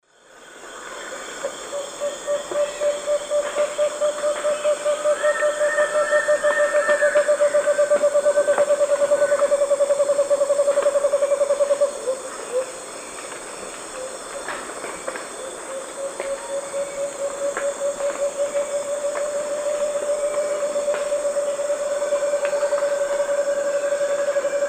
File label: Chamaeza nobilis, songs 2 birds; S. America; Eastern Lowlands